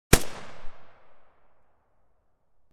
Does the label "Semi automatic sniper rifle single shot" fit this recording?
Yes